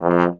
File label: F1, French Horn Stacato; DirectWave; Varazdin Orchestral